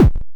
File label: hit; uberpong